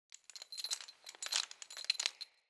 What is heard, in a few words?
Tent Ring Jingle, Interior; Soul Plane